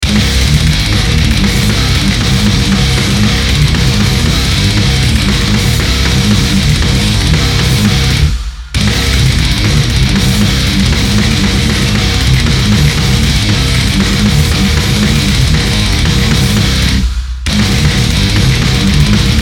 offbeat, metal; mmk44; 30 metal midi kits 4 4; daowaves

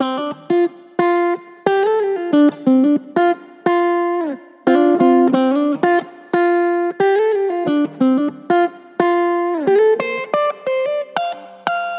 smooth guitar processed; kern audio

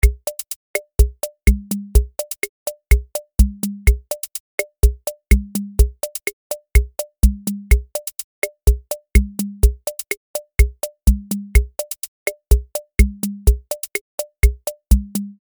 beguine; tiny pops; superwave

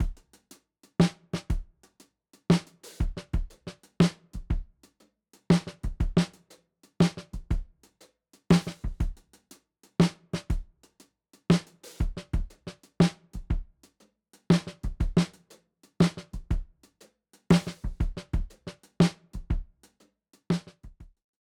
drums, 1970s kit; harvest kit

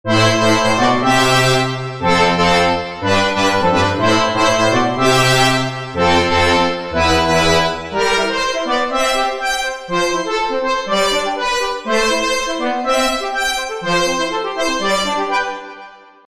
brass; fire by music sound software

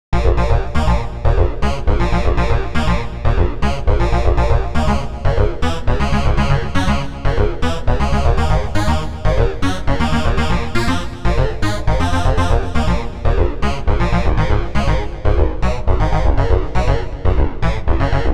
corn arp; the space soundset

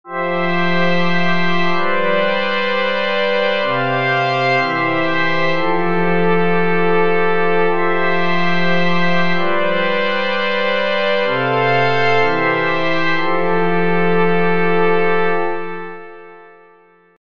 pad; fire by music sound software

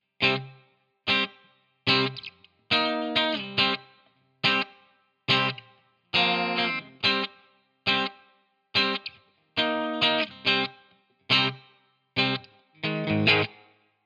free les paul; chiqui audio

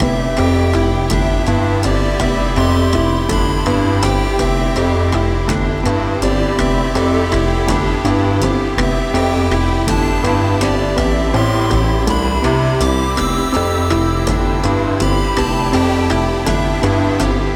full mix dry no airspace; airspace hybrid reverb and delay; modeaudio

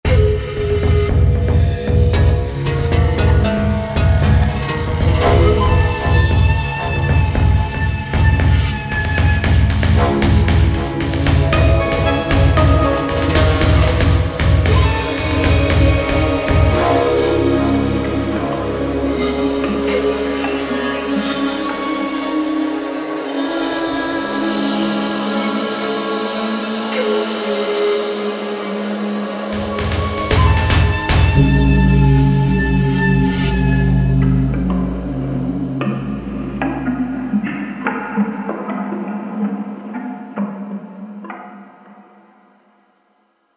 japan ambient; mugen yoru; golden screw studio

